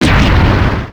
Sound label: Short Warp Echo